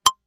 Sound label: tap ui